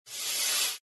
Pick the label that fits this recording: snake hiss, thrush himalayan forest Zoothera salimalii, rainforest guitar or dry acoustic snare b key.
snake hiss